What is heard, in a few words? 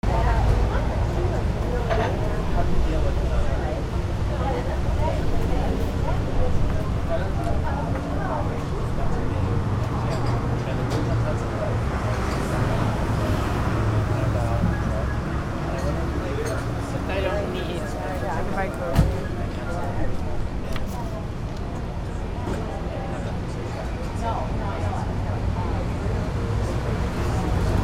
AMBIENCE